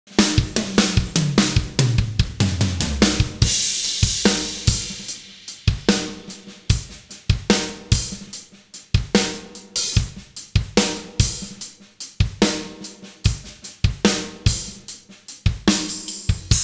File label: drums